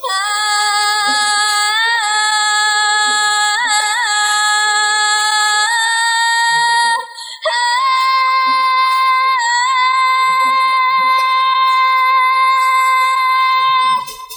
scream

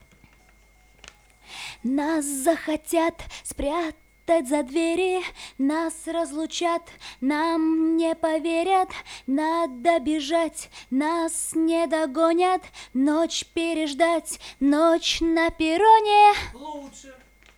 VOX Couplet, acapellas

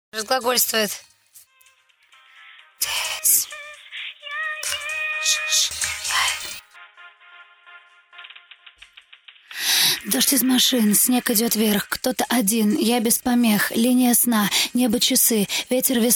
Acapella

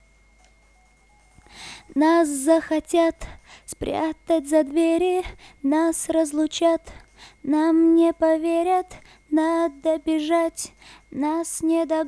VOX Couplet, acapellas

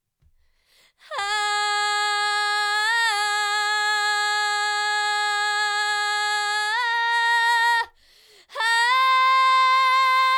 Scream Melo, acapellas

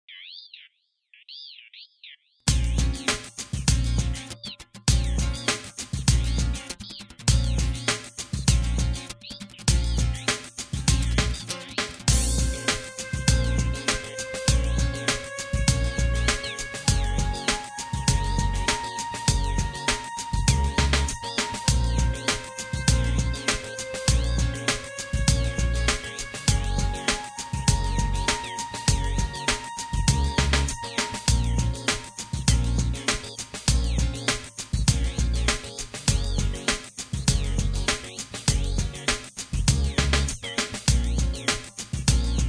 Instrumental

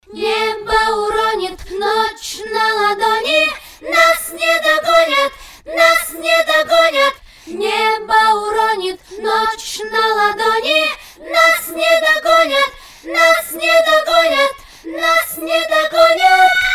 Acapella Mix

